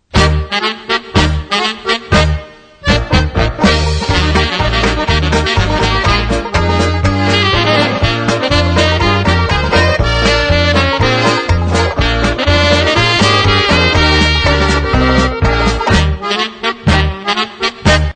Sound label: JAZZ TIME POLKA